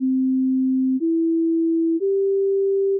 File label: chord